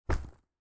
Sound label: drag drop, inventory